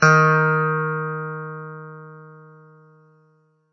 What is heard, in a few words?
chime